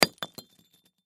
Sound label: Brick Stack Fall, Brick Stack Fall Over Break; Rocks; Materials; foley; Blastwave